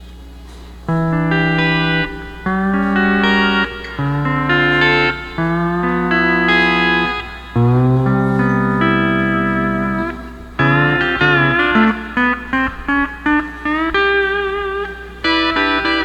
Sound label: 12 String Lap